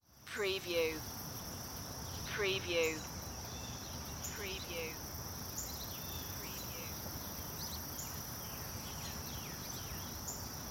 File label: Subdivision Day; Sound Effects Bible